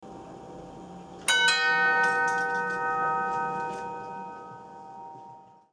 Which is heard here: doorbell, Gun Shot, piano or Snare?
doorbell